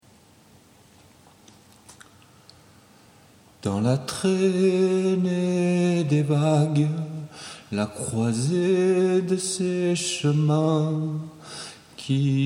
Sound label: vocals